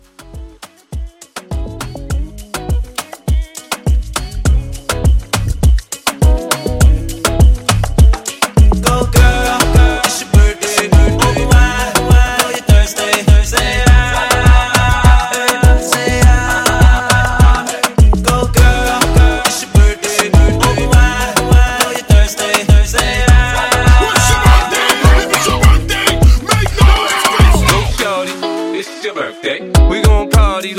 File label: Moomba Hype 102